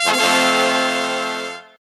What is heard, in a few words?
Ta Da; butterflysoup2